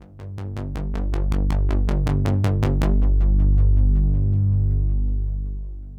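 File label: synth bass, ambient, 160, C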